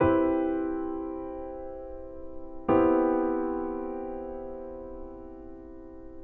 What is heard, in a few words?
7th and third inversion